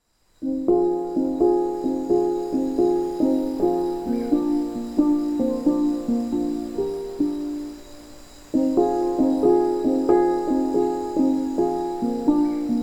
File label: the Hang and Guitar With Rainforest Sounds; New Age; Jazz; Healing and Relaxing; The Natural Sleep Aid